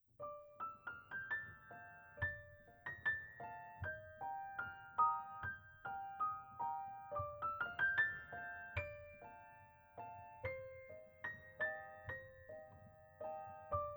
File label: Piano; PM40T